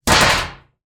foley tin lid drop on floor; zapsplat

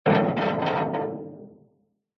impact metal huge heavy hit with scrape

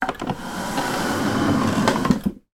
household coffee table drag across carpeted floor

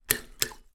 food spoon dig into bowl of cereal

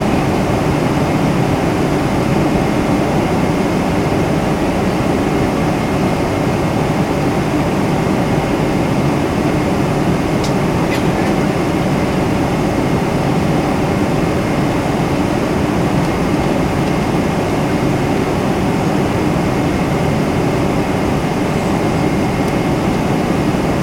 aircraft commercial boeing 747 400 interior; transportation; sound effects; sound effects one

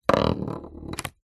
leisure toy small spinning top spin table fail

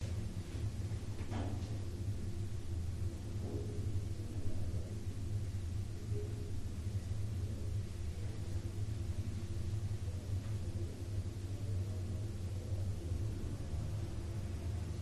Apartment Roomtone Bathroom; your free sounds

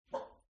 DOG Yapping Short; ANIMAL; smartsound

